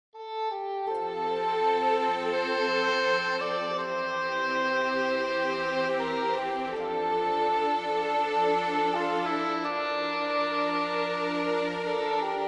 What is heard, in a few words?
Emotional Orchestral